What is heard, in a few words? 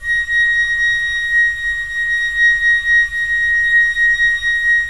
FLT FL, Flute; Roland - LA Composer vol. 4